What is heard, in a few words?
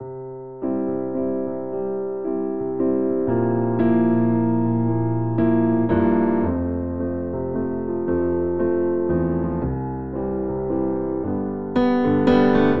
Progression Gospel I - bVII - IV - I - V C Major 116 bpm, Grand Piano; MIDI Clips; Ableton; Factory Packs